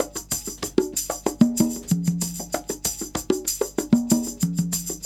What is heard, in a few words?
Percussion, R&B Drums; Sonic Foundry (Sony Creative Software)